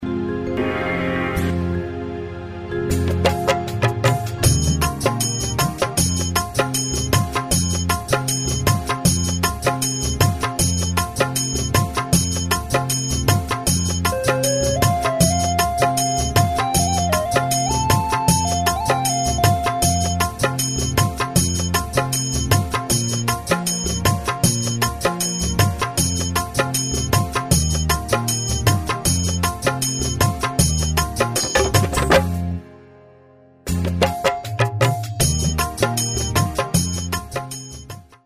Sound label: Karaoke Song